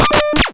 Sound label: Cwejman S1 Arcade Sequence; DevSnd Cwejman S1 - JK; DevSnd Cwejman S1 - JK Samples